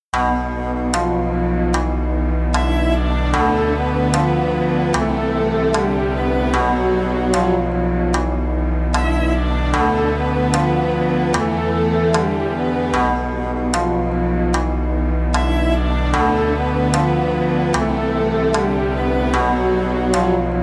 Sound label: Japanese Melody 150 BPM